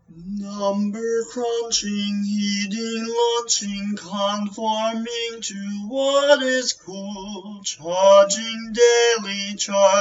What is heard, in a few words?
number crunching